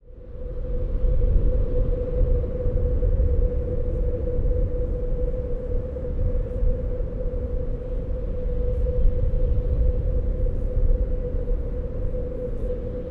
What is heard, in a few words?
winter wind